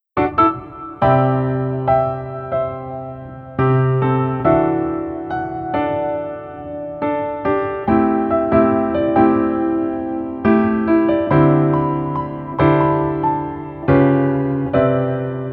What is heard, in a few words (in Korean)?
Piano ver 멜로디MR 여자키